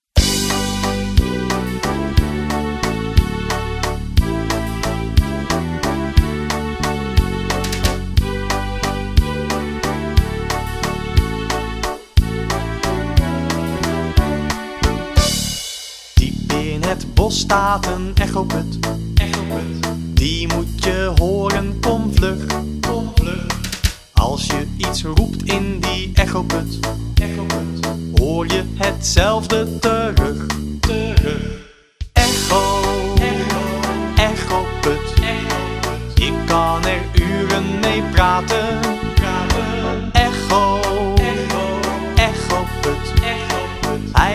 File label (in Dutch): Echoput onderbouw